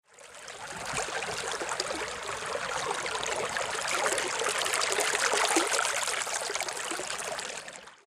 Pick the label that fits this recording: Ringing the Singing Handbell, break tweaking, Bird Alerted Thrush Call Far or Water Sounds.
Water Sounds